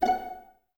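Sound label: Violas F 5 pizzicato, String Ensembles; Miroslav Vitous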